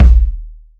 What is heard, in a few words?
nice bass drum sound d sharp key; kick drum samples